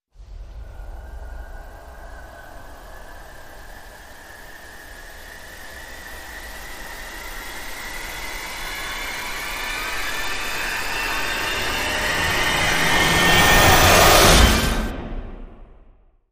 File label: Rise Takeoff